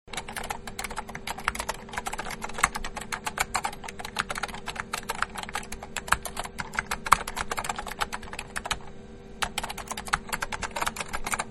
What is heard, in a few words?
Typing, Computer; Computers; Electronics; Manhattan Production Music